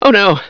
sci fear, scientist

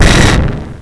beam start, debris

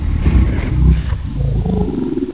gar idle

